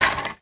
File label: household christmas decorations in plastic bag put down on table